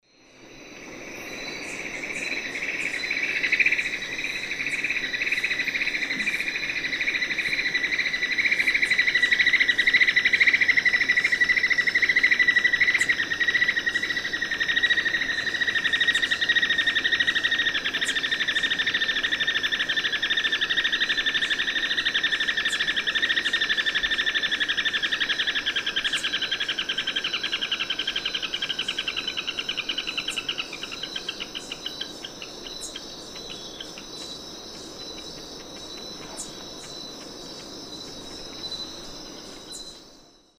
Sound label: Dendrocincla fuliginosa, rattle end; S. America; Eastern Lowlands